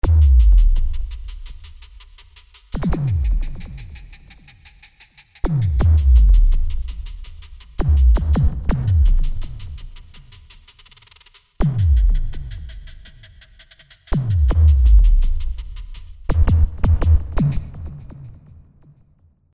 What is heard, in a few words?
Simmons HH; XILS